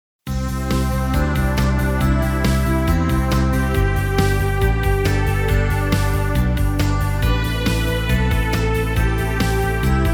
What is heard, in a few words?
Corporate music